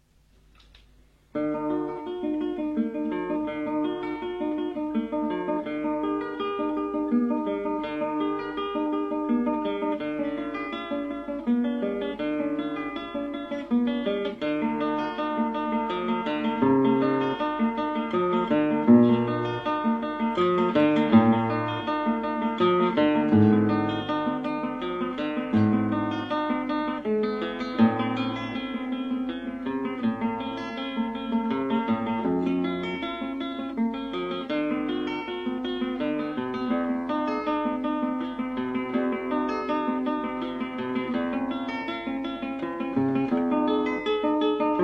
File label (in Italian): chitarra; J.S.Bach Preludio BWV 999